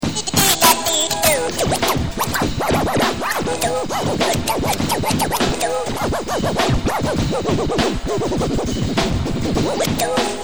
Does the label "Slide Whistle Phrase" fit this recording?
No